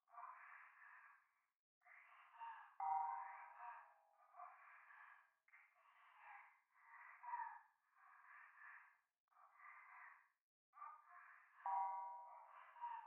右耳 密着 処女 喘ぎ 寺; 夢の中

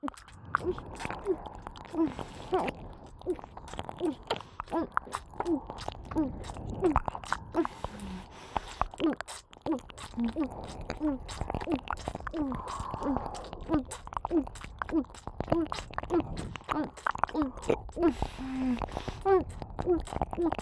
イラマチオ＋両耳舐め; 3WAYループ; オナサポ

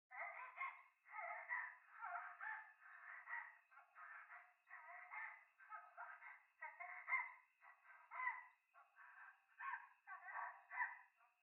トイレ 喘ぎ 山奥 夜

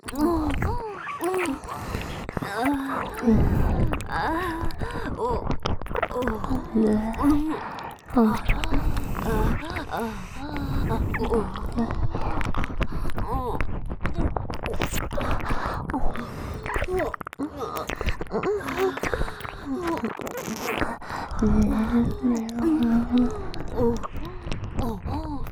オホ喘ぎ＋れろれろ両耳舐め

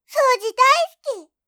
ごみ箱を空にする

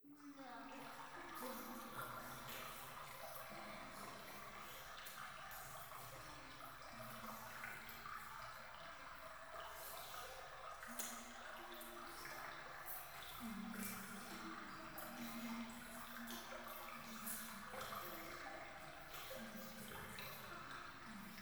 風呂 フェラチオ 川 の せせらぎ

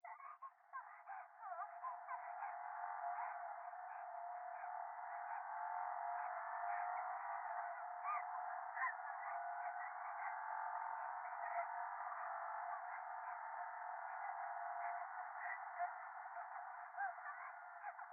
電話 可愛い喘ぎ 車の中

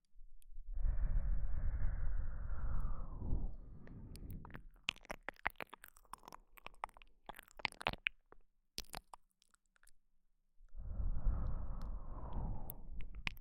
KU100 右耳 優しくて気持ち良い

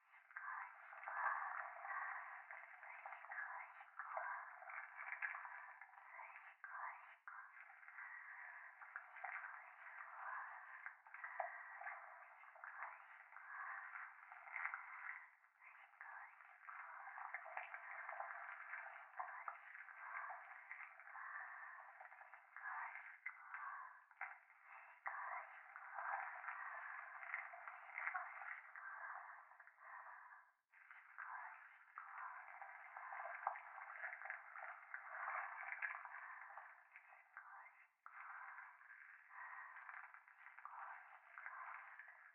風呂 しこしこ 触手

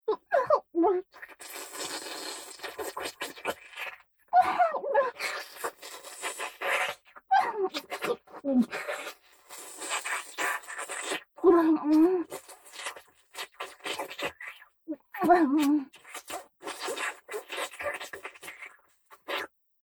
フェラバキューム

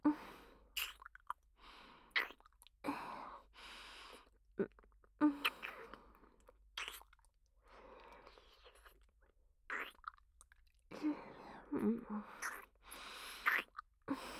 キス, ASMR

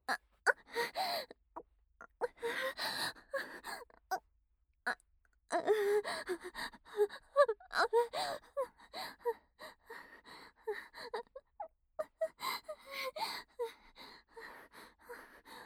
座位喘ぎ, ASMR

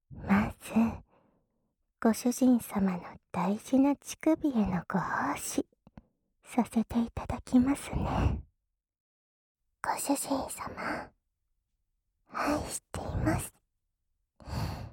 双子メイドによる両耳舐めカウントダウン手コキ; SEあり

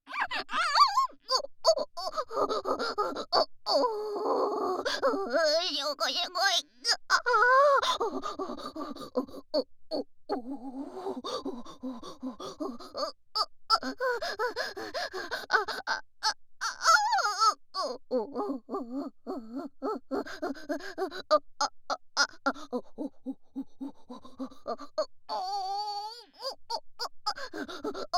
オホ声; SEなし

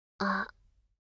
あ…; Windows等向けシステムボイス

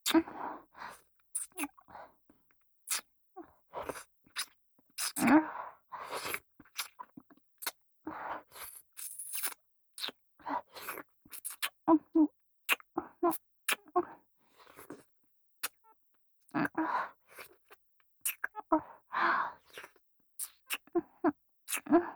キス

